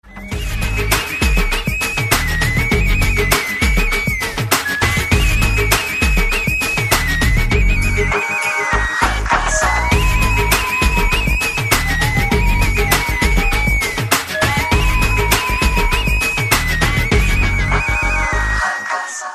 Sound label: Clear Music